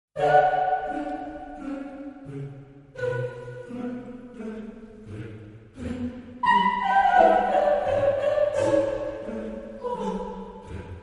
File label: ending, musics